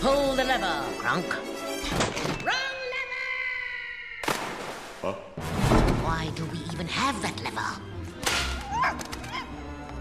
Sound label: pull the lever